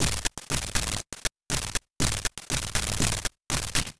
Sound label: Noise Beat; Drums & Drones